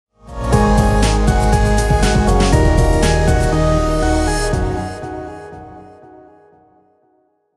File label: sting funny summer happy travel vlog music